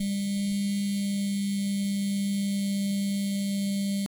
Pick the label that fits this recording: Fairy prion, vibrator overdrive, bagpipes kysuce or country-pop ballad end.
vibrator overdrive